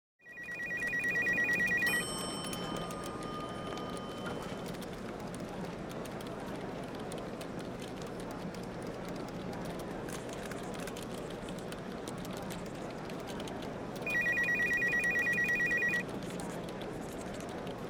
Ambience Office Walla Heavy Phone Rings Elevator Bell; Buildings; SFX Bible